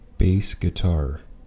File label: bass guitar, Musical instruments; Anglais